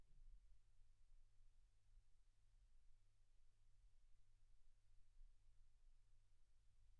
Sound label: silence 48kHz